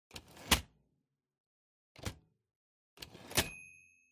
Typewriter Tab

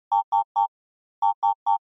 News Alert

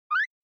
Motion Slide High

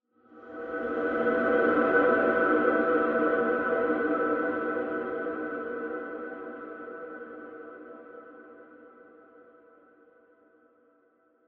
Horror Accent, Long

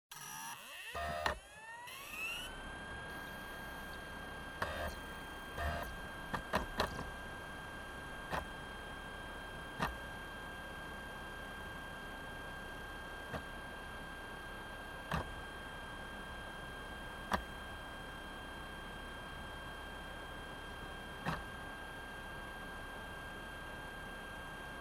Optical Disk Drive Operating Noise

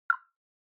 OS Sound (Speaker-Notification)

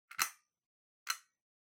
Pendant Light Pull

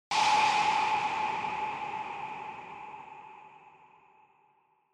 Single Accent High

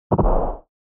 Fighting Explosion; SNES

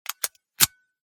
Padlock key

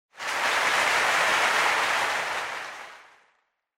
Applause Crowd Short

